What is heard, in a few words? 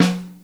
SN Overhead, Snare; Ableton Foundation FreePacks; Snare Designer